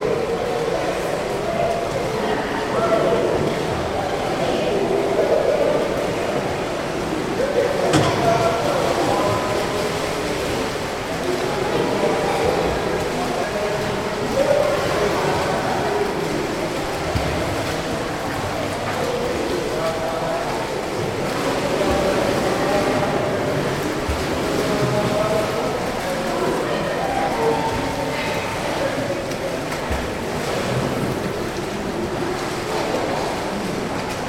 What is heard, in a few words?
ambience swimming pool; sound effects one